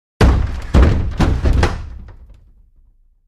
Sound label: Large Chest Dropped